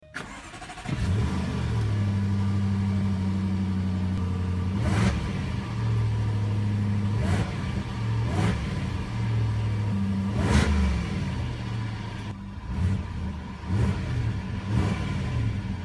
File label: Jaguar XF